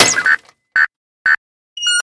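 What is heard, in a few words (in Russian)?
повторяющийся электронный неопознанный сигнал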